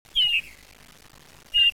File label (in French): bouvreuil